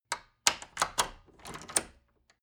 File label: wood door old lock turn key